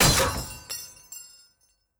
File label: Pistol Helmet Expl; Pistol Hero Helmet